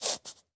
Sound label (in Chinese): 狐狸嗅闻声